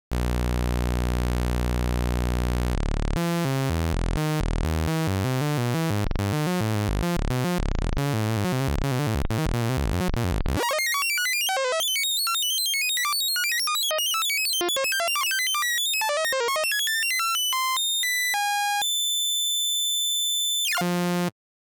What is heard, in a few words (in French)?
LFO Sample Hold pitch 12 st rate augmente puis aussi le pitch